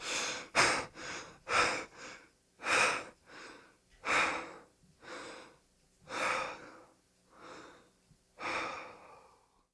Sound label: player out of breath, police